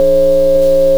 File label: AMP NOISE, Funk Gtr & Wah; PlatinumPhattCD2; E-MU Producer Series Vol. 8 – Platinum Phatt